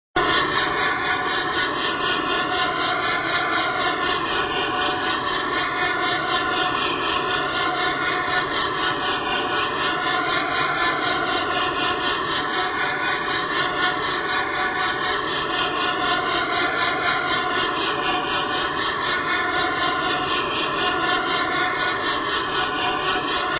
Evil Breath, Creepy evil breathing background sound; Creepy evil breathing background sound excellent for evoking fright and fear, perfect for horror-thriller genre or video games